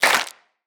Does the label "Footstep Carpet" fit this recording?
No